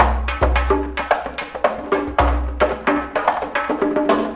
110 afro cuban roll clanger, drum loops and breaks; 101 to 110 bpm